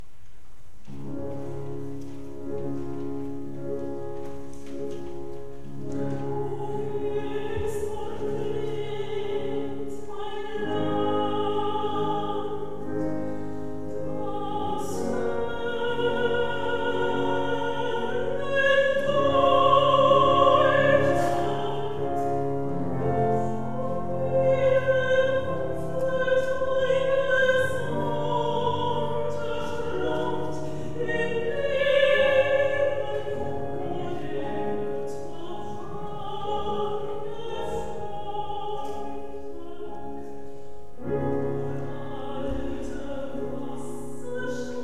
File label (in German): Gesang; Hugo Wolf, Gesang Weylas